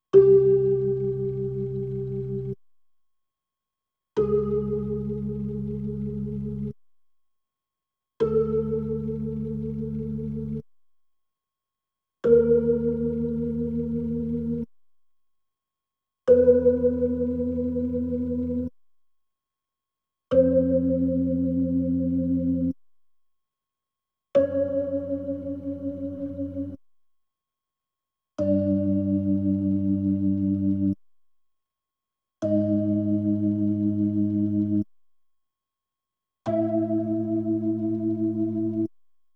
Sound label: B3 Organ; Masterbits CLIMAX 8